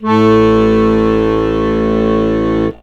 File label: HOHNER, akkordeon